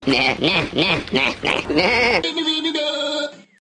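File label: nanny goat; animals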